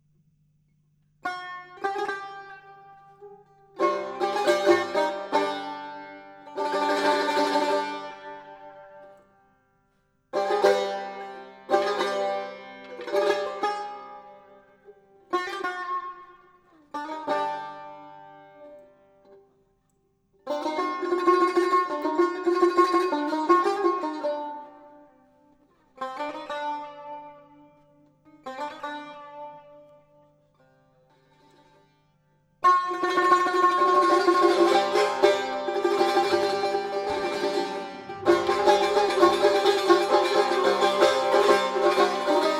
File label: Dastgah